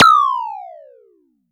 E-Perc Ring, Electronic Percussion, Drums; Ableton Live 12 Lite; Core Library

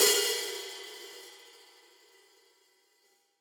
Hihat Open

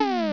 Subscreen Open; Open Zelda; Quest Designer 2.0.4b

